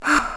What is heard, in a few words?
Breath